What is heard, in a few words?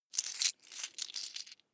coins clatter medium